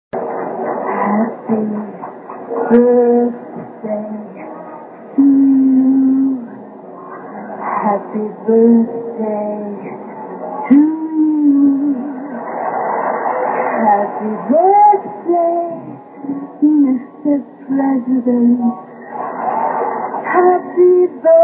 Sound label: happy birthay